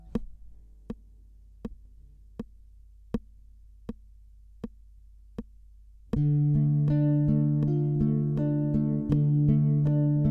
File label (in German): Akkord d-moll, gitarre